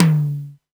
DDD-1 Tom High, drum kit; Polyend; Stazma & Concrete Collage; Concrete-DDD1

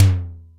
SDS9 MT, Simmons SDS-9; SDS9 Factory A1

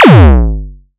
Borg Kick, drum kit; Polyend; Stazma & Concrete Collage; Concrete-Dual Borg

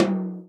tom, Real Drum Kit, jungle kit

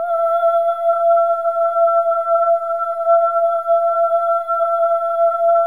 SOLO BOY, AH; Spectrasonics; Symphony of Voices

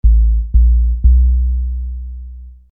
Low Sub; Construction Kit; HIP-HOP; Sonic Foundry (Sony Creative Software)

